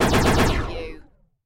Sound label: Laser Cannon Four Shot; Sound Effects Bible